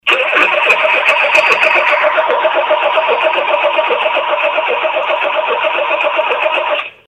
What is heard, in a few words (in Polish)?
silnik; renault 25; brak paliwa